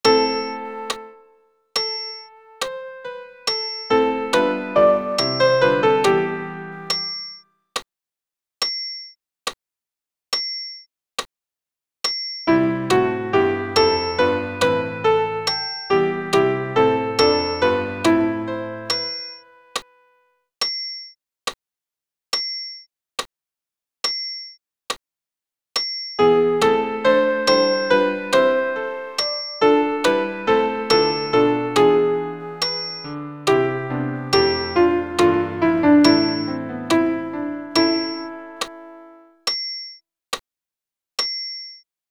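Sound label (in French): Ite missa est mezzo piano accompagnement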